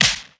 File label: Slap, Foley; musicradar; cartoon caper